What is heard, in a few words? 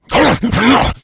rage, infected, male; npc; Left 4 Dead 2; Source Engine